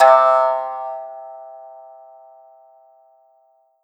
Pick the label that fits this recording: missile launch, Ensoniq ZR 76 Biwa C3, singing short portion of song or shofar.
Ensoniq ZR 76 Biwa C3